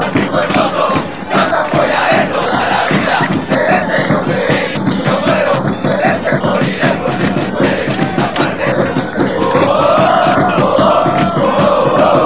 fan chants, football; tampico hermoso; jaibos tampico madero

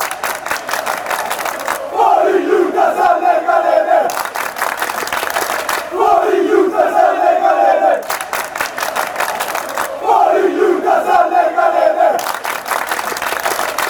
out of prisons fanchants

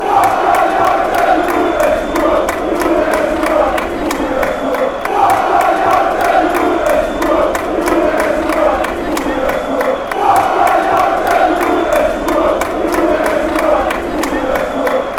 den haag chant

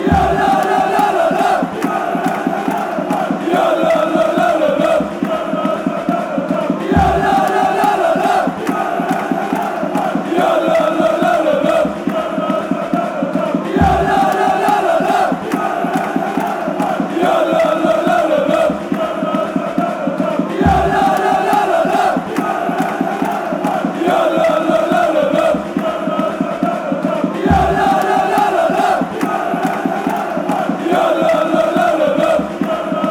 jalolololololo

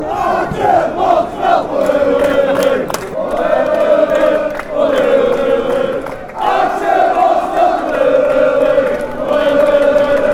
ado chant

